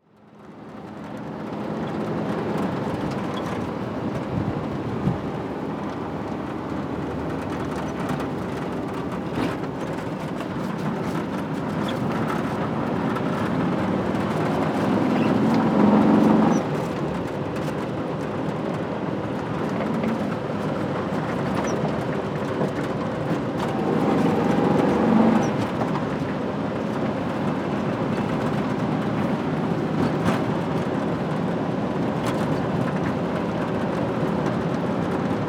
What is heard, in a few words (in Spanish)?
CAMIONETA INT Combi en marcha camino pedregullo